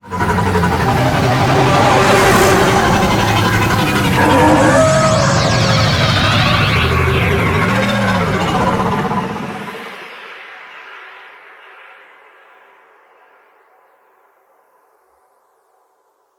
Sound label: Liset Level Docking, Vehicles; Warframe; WM Liset